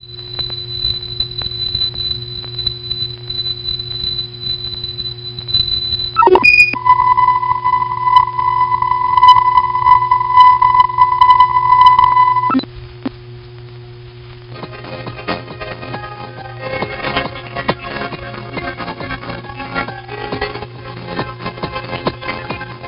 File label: Laser LED scintillation comparisons pilot removed